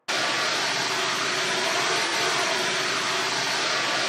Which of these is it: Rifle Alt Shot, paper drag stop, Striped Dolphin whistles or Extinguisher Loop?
Extinguisher Loop